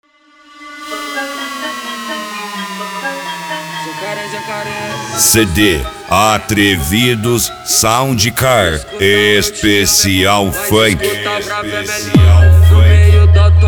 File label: funk